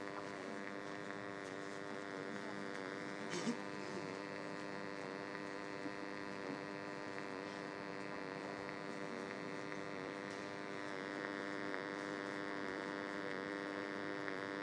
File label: charlton rail indicator board hum